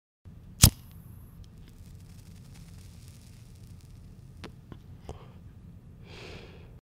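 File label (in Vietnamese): tiếng châm điếu thuốc lá và hút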